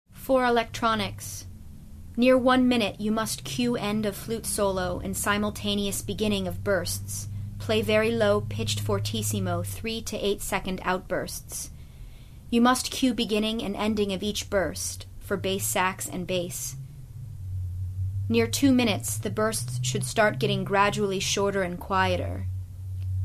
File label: Score Electronics